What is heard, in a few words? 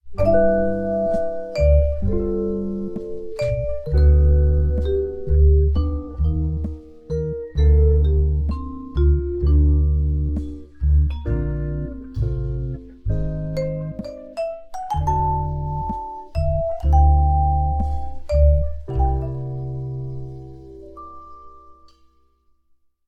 Fall Stroll, ringtones Calm; Samsung Galaxy S24